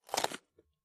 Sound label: Bite Vegetable Peppe